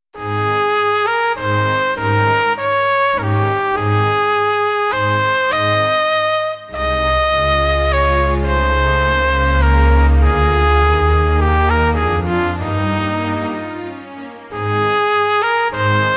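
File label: trp, trumpet; Haydn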